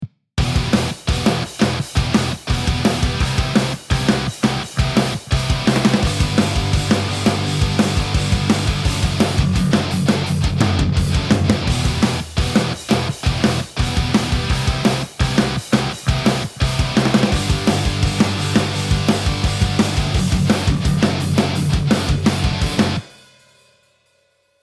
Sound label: Palm mute